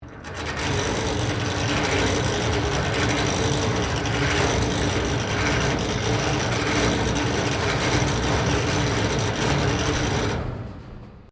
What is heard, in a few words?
device unknown rotary operating, Colonial era objects; Machinery Sound Effects